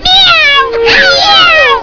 CAT; 特酷声音素材库

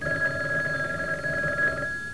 PHONE, 电话; 特酷声音素材库

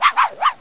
DOG; 动物; 家畜; 狗; 特酷声音素材库

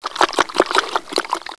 Water, 自然, 水声; 特酷声音素材库

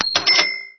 Money; 暴力赛车

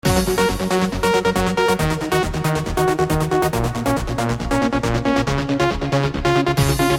flash mix

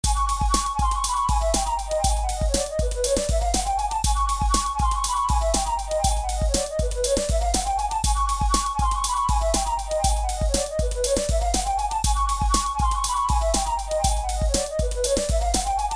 Classical

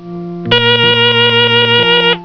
PHONE, 电话; 特酷声音素材库

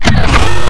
Game, 游戏音效; 特酷声音素材库

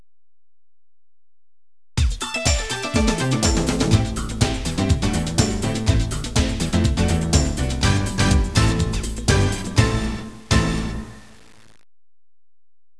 dance

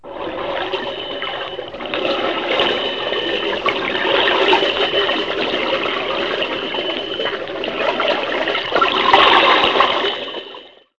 Water, 自然, 水声; 特酷声音素材库

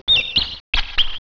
Bird; 特酷声音素材库; 动物; 鸟

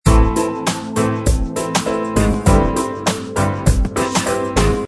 loop

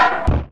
Game, 游戏音效; 特酷声音素材库

